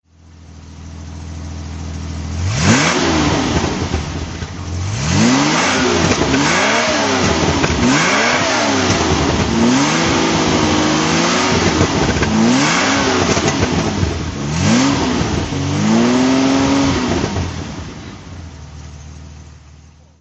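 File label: etype v12 throttle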